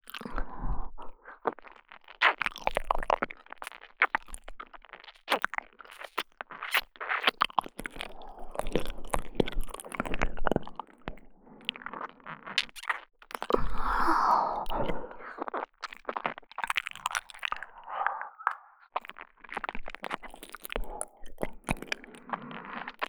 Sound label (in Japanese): 耳舐め, ASMR; バツイチお姉さん; 耳元に感じる鼻息吐息